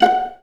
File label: VA PIZZ F#4, Orchestra; Best Service; Peter Siedlaczek's Advanced Orchestra